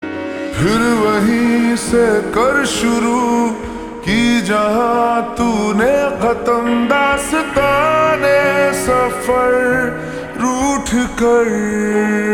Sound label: Ringtone Slowed Reverb